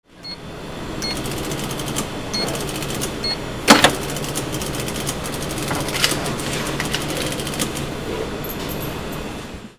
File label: AMBIENCE